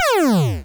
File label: laser; spelunky 1 1